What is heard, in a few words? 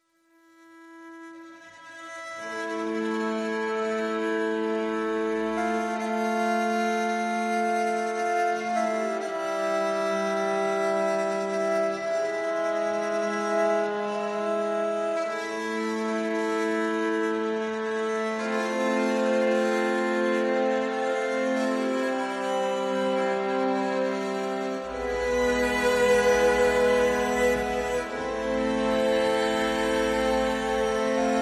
Strings Only Mix